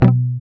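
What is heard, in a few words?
GUI B, acoustic guitar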